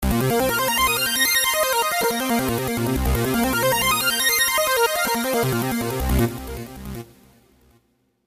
LA Saw, A phrase that uses LA-SAW to enhance the effect of the PW (Pulse Width).; V-Synth